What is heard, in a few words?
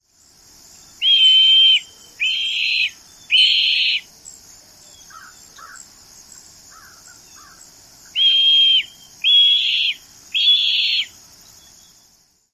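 juvenile squeals, Red-tailed Hawk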